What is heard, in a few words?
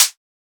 Closed Hat; FLPRO